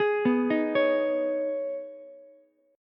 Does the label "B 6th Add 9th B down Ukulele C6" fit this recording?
Yes